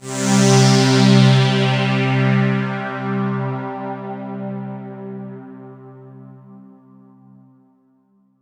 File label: Roland JV 2080, interval; MG INTERVAL